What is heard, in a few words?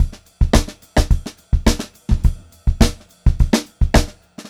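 106 groovy variation 2, funk groove drumloops; USB Soundscan vol.38; AKAI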